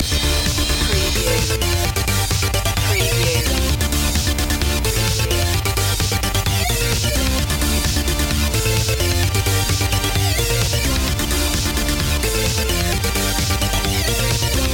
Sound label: fast beat gamer loop